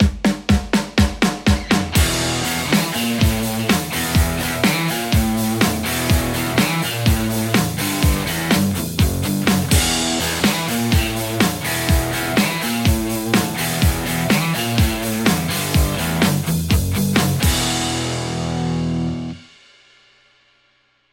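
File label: Stratocaster crunch; aradaz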